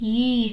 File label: yeeeeeeeeeee